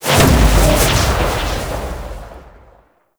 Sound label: Void Dragon Orb Cast; Enemies; Abilities; Warframe; Duviri